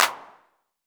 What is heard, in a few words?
Clap 909; gate experiments